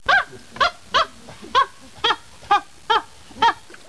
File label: DOG, 狗; 动物; 家畜; 特酷声音素材库